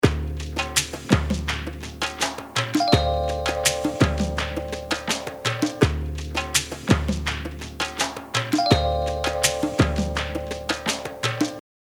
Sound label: FIELD LOOP